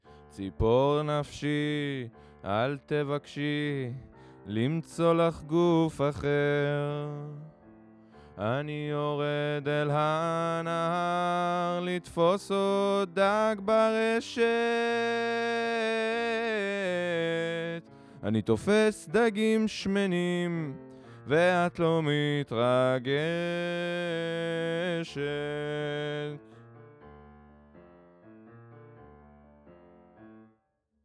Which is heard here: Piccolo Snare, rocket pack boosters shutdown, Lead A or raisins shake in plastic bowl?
Lead A